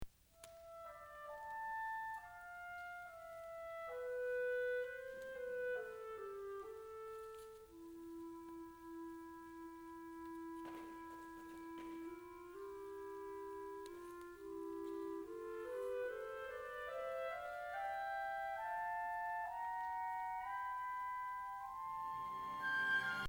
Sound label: Piano